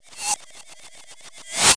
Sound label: jitter, space, strange; KABOOM! - Wild and Wacky Sound Software